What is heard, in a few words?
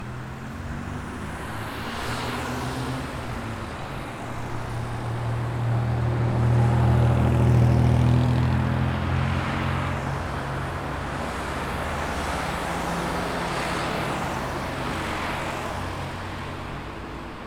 Light City Traffic; Welcome to the Game II